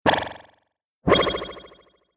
Future Telemetry Two Glassy Computer Beeps Interface Delayed Space; Sound Design; Communication Sound Effects